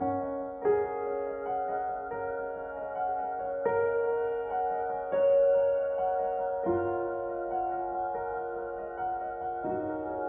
Hospital Room Loop

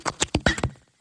down event

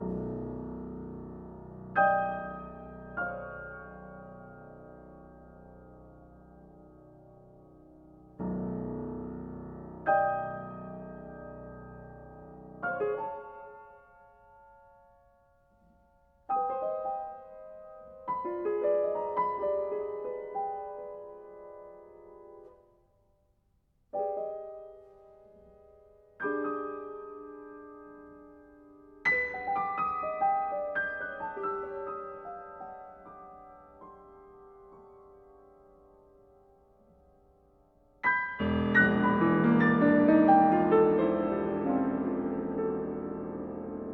Piano Impromptu

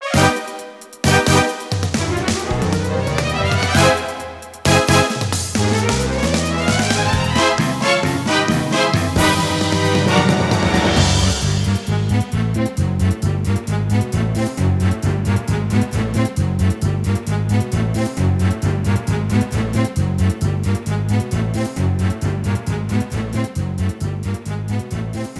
battle intro; Yokai Watch 2; oni battle

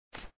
grab ticket; papa s freezeria gamerip

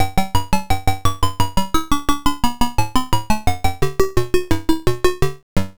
Bonus Stage Clear; Next Round 2; uncle poo arcade gamerip 1983

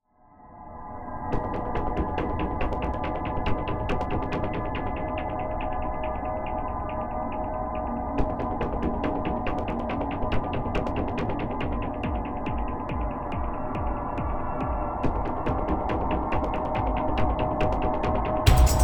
elevator disco; Crysis 3 re-engineered soundtrack

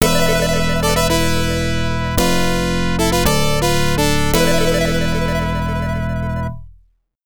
Jingle, OPN

